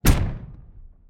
energetic heavy shot; Barotrauma; EK modern

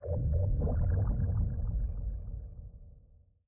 Ambient; Barotrauma; Subsurface

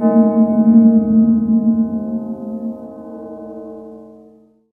strange horn; Barotraumatic

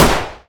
handgun; Weapons; EK modern; Barotrauma

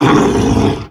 attack claw; Thalassophobia Restored; Humanapostle

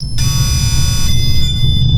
sonar Ping Water Close Beacon; Barotrauma; Real Sonar

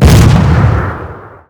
gunnery big flak explode; Weapons; EK modern; Barotrauma

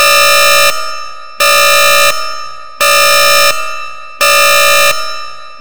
Biohazard; Electricity; Thalassophobia Restored

